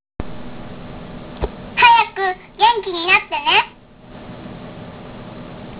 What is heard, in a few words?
cheerup